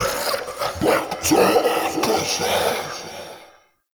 Mockery Male Vocal Death; Characters; Enemy; Vocals; Mockeries

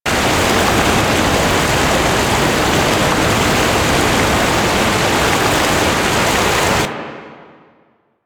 Camp River Close Heavy Loop; Warframe; Quests; TheNewWar; Ambience; Drifter Camp; Water

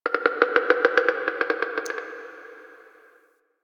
Wood Creak; Warframe; Quests; The New War; Ambience; Zariman

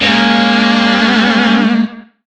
Shawzin Yo Chord J; Music; DE; Warframe; Lizzie Shawzin

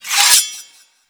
Light Glaive Blade Charge; Warframe

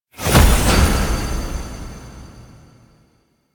Boon Fragment Pickup; UI; Pickups; Duviri; Warframe

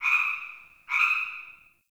Cave Ocean Creature Close; Midrath Island

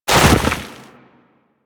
Operator Land Soft Deep Snow, Footsteps; Warframe

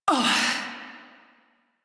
Pain, Female; Railjack Crew; New Loka

